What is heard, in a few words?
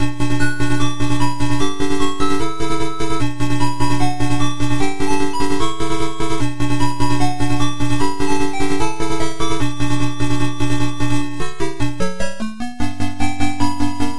Ringtone